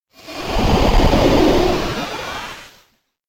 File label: Whoosh Space Vehicle Slow Spacecraft; Scifi Science Fiction